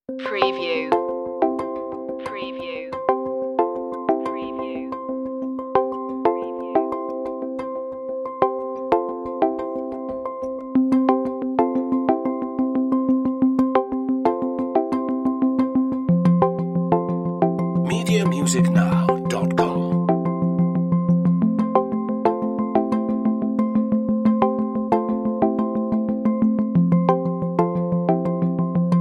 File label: no percussion